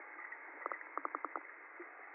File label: fish thumps